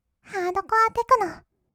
ハードコアテクノ; 1200 kawaii voice!!; 音楽ジャンル 56種

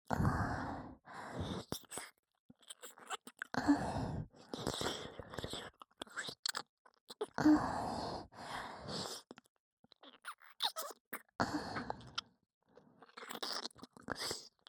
右耳舐めループ

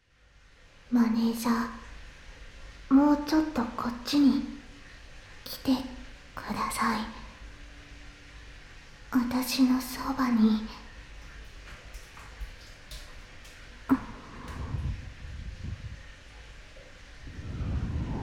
お風呂場で耳舐めサポート; アノ娘と温泉旅行編

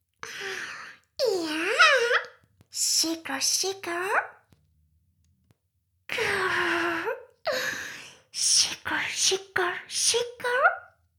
シコシコ音声, ASMR; 魔法少女マスカリマッスル

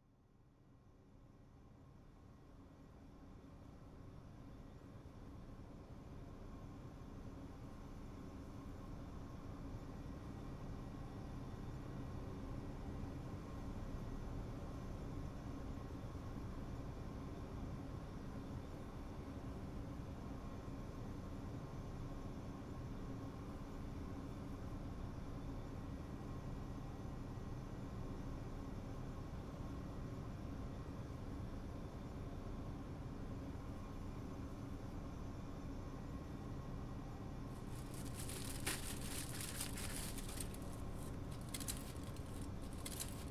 ストーブ、アルミホイルで焼き芋、鉛筆削り機で鉛筆削り; あやかし郷愁譚異聞 ～夏葉 妊娠6ヶ月～; ASMR; あったかお部屋で試験勉強

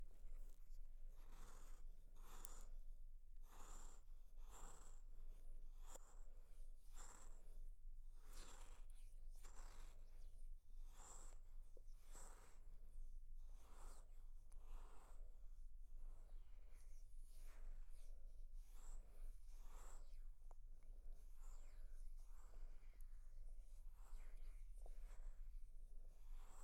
もったりするまでスクラブまぜまぜ, 右耳

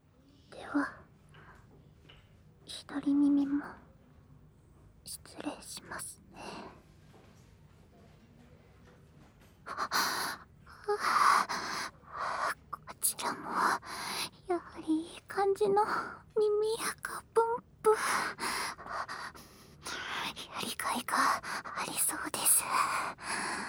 スクリューな耳かきです（左耳）, ASMR; 今日は変わり種。

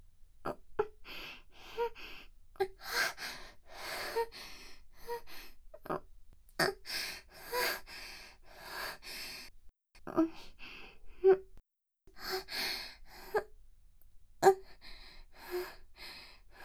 喘ぎ声ループ

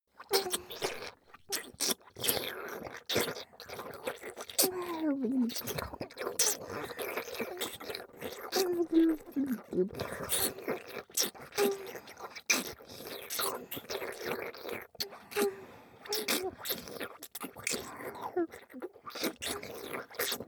SEあり, ベロチュー＆フェラチオ; オスイキフィニッシュ; ベロチュー＆フェラチオで射精誘導

